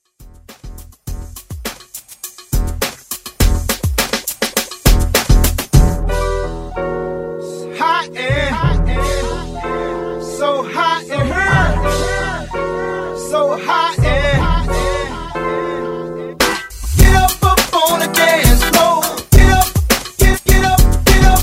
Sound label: Throwback Redrum